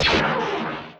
gun turbo

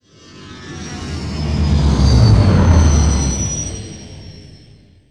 flyby falcon

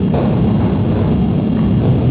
beep

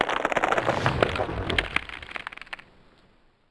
bridge break

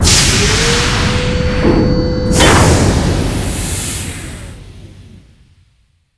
def gun raise; trek

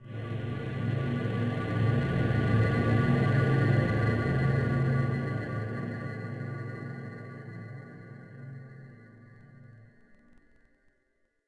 subway

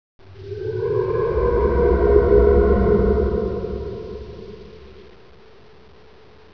cave

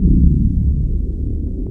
eng warpcore; trek

